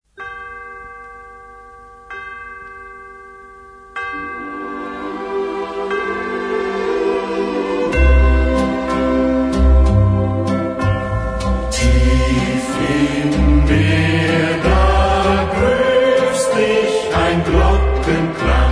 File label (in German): Glocken Serenade